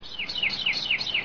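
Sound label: carolina wren, birds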